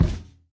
walk, iron golem; minecraft; mob

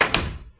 door close; Eaglercraft; eaglercraft-b1.3

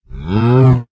say, cow; minecraft; mob

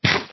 eat; ayuncraft 1.5

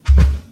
step, cow; ayuncraft-1.5

